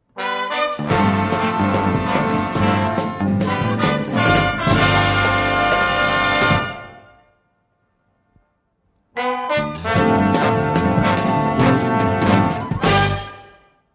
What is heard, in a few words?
Music Bumpers